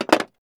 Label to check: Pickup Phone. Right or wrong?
Right